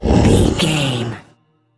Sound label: troll monster effort growl small